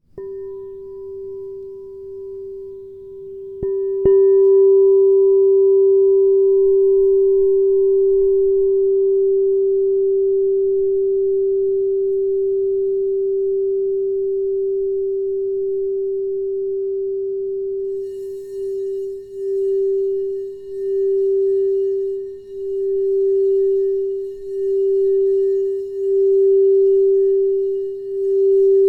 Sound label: Crystal Tones Singing Bowls